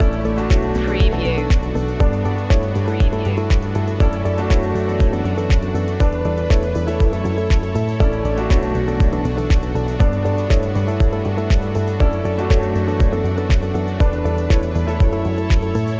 a perfect pitch loop

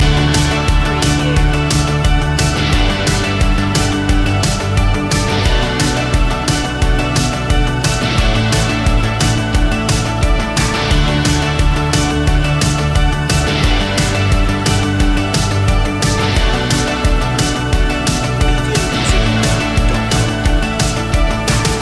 take on the day loop

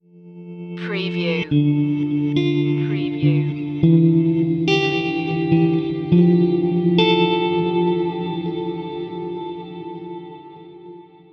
slow down stinger

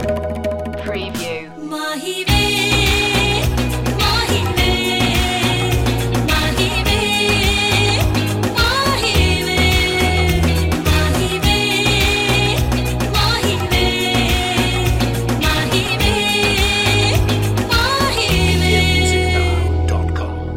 bollywood reggaeton loop; Royalty free music with Indian influences ideal for creating an exotic ethnic feel.

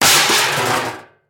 Impact Garbage Can Lid

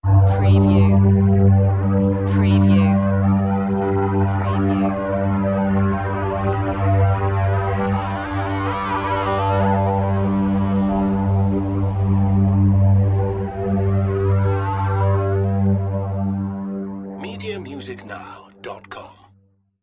Moon Trance Pad

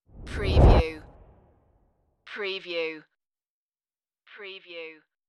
Rush

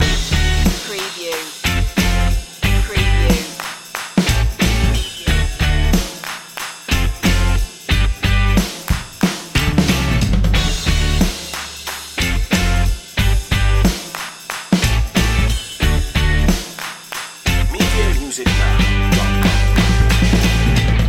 slam the door loop

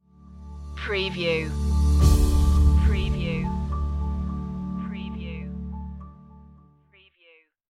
bike ride stinger

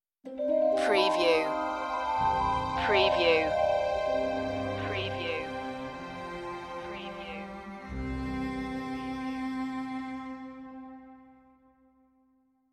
travelling by moonlight stinger

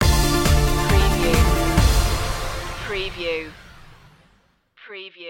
hands held high stinger

